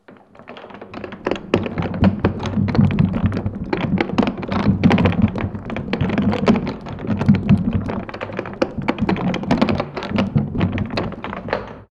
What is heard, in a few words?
rolling wheels long on stone tiled floor